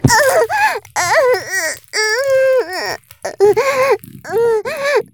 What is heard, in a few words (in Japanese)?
絶頂 潮吹き; 絶頂+潮吹き+中出し ランダム再生